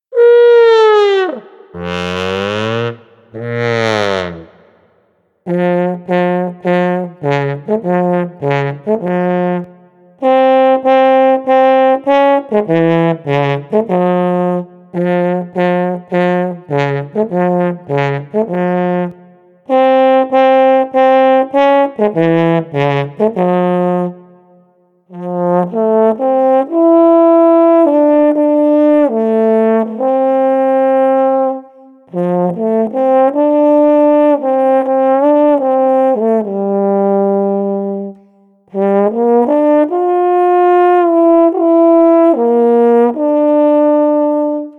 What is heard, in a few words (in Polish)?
Puzon